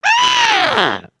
Angry, emotes

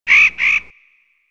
Birds

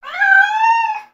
Pet Cat Beg; creatures pets; Lego

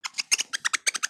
Pets Skunk Happy; Lego; build mode

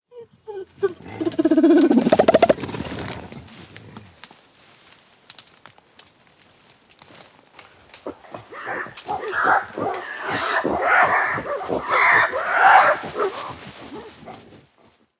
Jungle Primates; gnarled forest

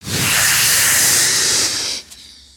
Pets Tortoise Angry; creatures; Lego

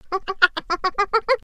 Monkey Laugh; ambience; Pistol; gnarled forest

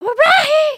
come here, emotes

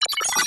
cpu interact

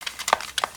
idle rattle; creatures organic

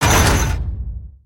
Bridge lower

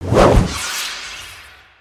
Samurai Ronin Rush

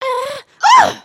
backflip cautious; emotes

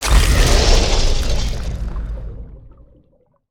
Ice Rail Start

